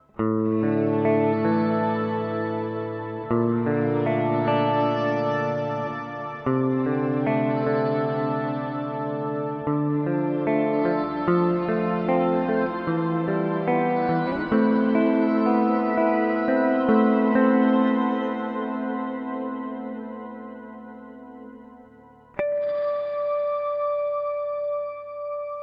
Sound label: Shimmer Reverb; Source Audio Collider